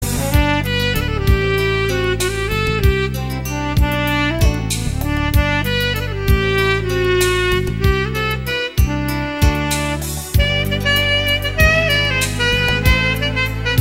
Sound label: Sax Part